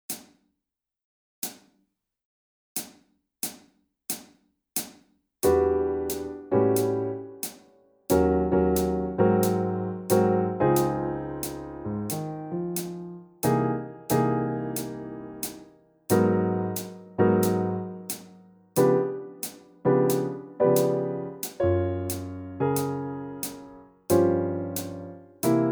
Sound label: Slow Tempo only Piano